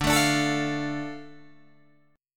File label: D Minor, Guitar, Open D